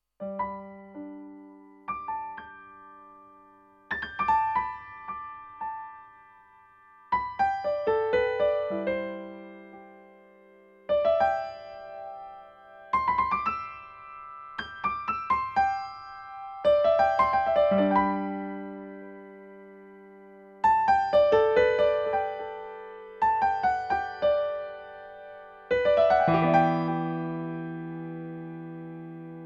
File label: Piano